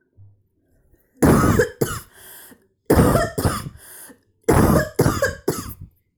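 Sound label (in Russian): Zvuki - ZHenskij kashel, female cough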